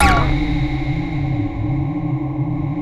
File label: hit, brass